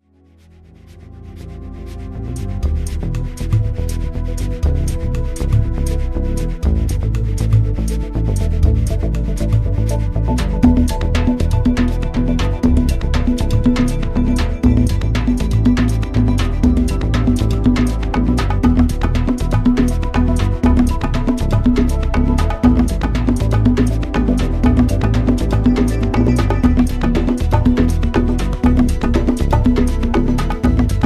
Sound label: supreme beats; spectrasonics